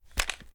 inspect, reloads; gmod

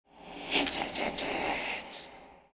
eyelander idle; gmod; durgz; meth

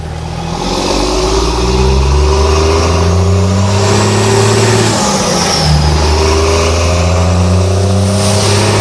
no shift, vehicles

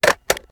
reload mag in; reloads; gmod

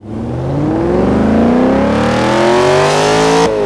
first, vehicles; gmod; tdmcars; hsv gts

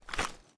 reload empty fast end, weapons; gmod; viper

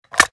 ar reload empty fast magin; weapons; akilo47; viper

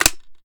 reload empty fast mag in; weapons; gmod; viper

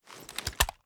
sm uzulu reload empty scope fast end; reloads; iw8; gmod

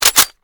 reload empty chamber; gmod

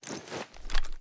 kilo98 reload empty fast end; gmod; viper

